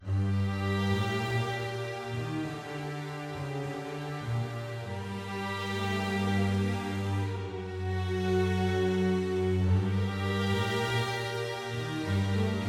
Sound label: String Main